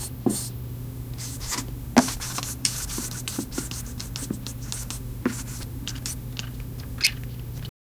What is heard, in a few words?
the gentle sound of flour; cascading